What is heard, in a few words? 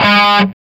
SOLDANO ROCK, Guitars